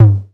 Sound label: 808 TOMS; Wizoo - Platinum 24 Electronic